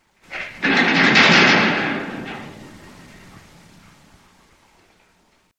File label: rattling door opening, wood